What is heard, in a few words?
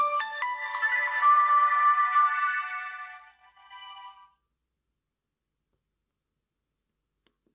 Nokia Sms 1.4